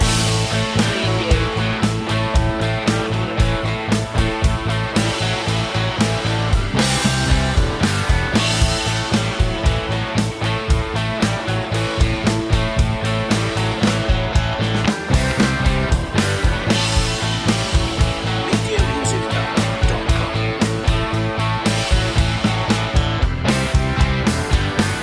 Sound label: cruisin loop